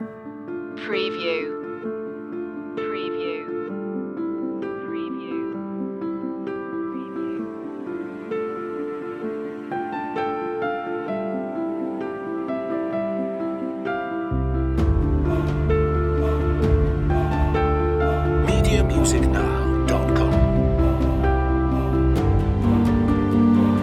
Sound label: beast mode loop